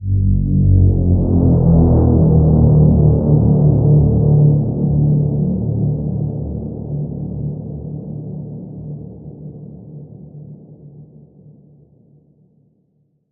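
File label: Bend; Slipping Away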